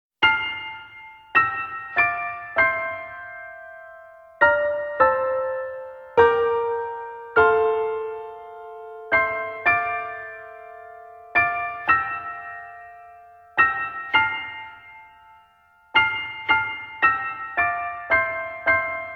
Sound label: chime